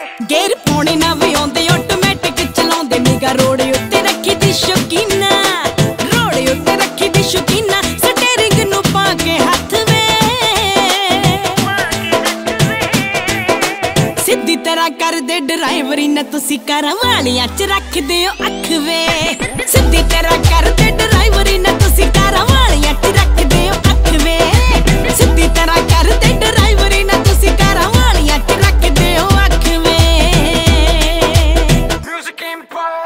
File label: Latest Punjabi Song Ringtone